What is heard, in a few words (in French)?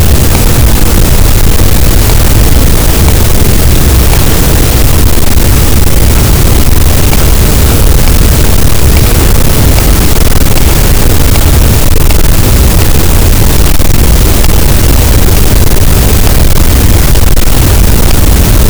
cachalot, Physeter Macrocephalus, gaussian noise